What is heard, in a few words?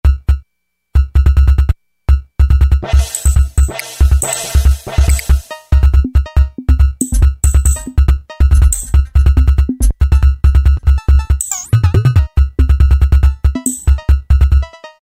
Hi Tech & Nz, Roland V-Synth; Factory Patches